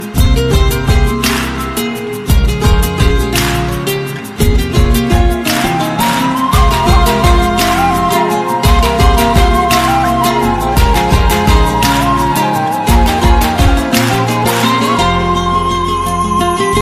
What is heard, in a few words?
new english music ringtone